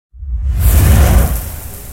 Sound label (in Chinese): 闪现音效; gamerip; Wild West Shootout